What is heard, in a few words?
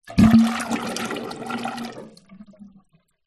household bathtub drain gurgle